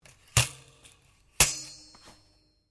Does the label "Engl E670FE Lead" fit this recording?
No